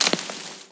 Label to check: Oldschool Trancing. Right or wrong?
Wrong